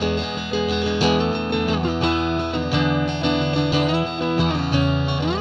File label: Clean Guitar; Stringed Instruments